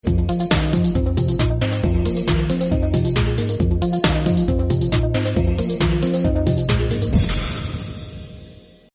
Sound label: Anthem Themes, stingers